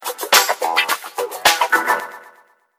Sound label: Funky Alert, notifications; Motorola Bravo